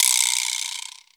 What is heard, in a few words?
NOISEMAKER